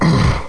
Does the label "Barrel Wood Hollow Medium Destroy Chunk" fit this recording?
No